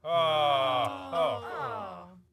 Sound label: Small Jeers; Levels Hub Orleans; Music Voodoo